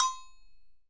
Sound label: Agogo Low Long, EMU PX-7 Drum Machines